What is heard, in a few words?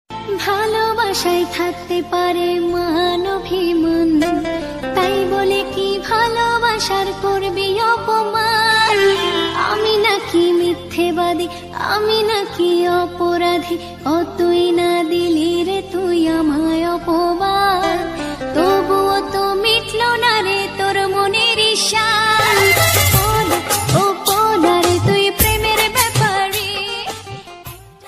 Bengali Sad Song Ringtone; ringtones